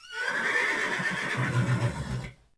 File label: Horse Vox; Ambient; Civ4 warlords